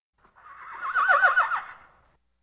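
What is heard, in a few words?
neighing in a stall close short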